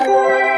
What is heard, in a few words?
stop; Virtue-Slots